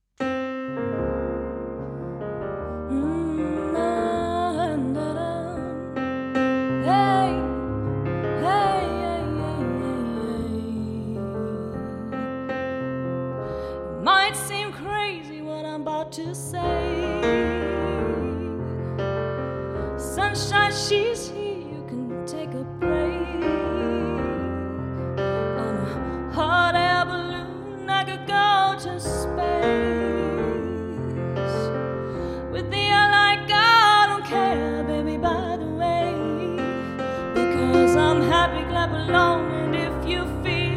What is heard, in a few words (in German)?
Loungeband Chill Happy Piano Drums Gesang Band; ModernJukes; Hannover; Hochzeit; Empfang